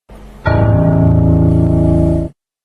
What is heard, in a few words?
piano; prowler